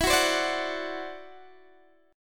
E 7th Suspended 2nd Sharp 5th, E, down, Guitar, Open D